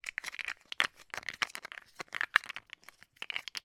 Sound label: toy mega blocks x3 movement in hand; zapsplat